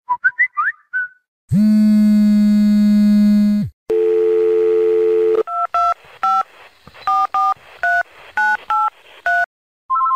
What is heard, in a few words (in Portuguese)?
EFITOS PHONE; pack de tilhas e efeitos 2.0